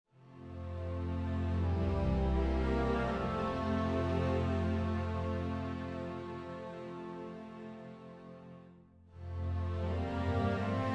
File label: bad bass